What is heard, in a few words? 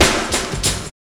Prog Stepz Cut, Drum Loops, 166.5; Zero-G Jungle Warfare 1, 2 & 3; 90 s Sample CDs